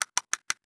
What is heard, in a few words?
Prop Slow